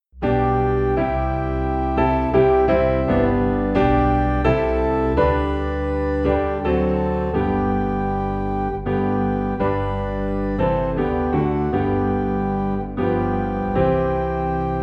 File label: Piano and Organ in G; I Need Thee Every Hour